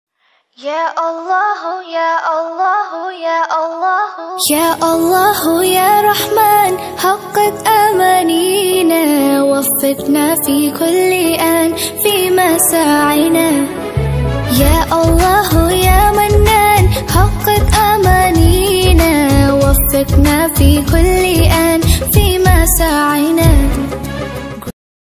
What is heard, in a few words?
arabic ringtone